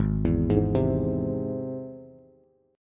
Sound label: Ab Augmented Ab Bass; Ab+ arpeggiated; JGuitar